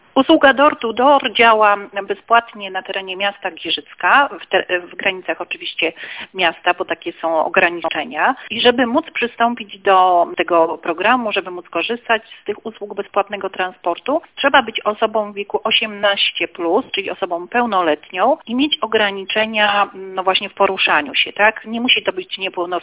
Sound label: door